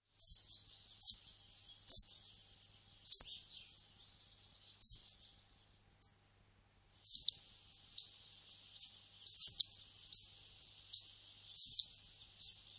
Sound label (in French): gobemouche gris, chant